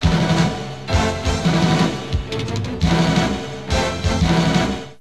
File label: Title Jingle; super space fortress macross; arcade